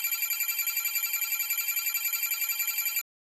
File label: inside telephone bank; video games; ambient; Source Engine; tf; lair